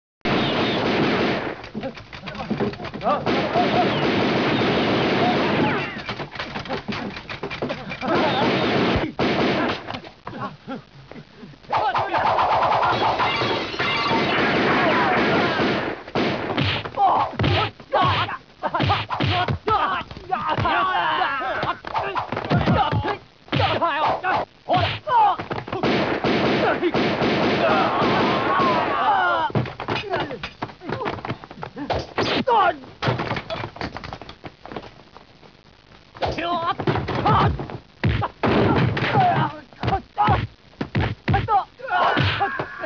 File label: Fight; 特酷声音素材库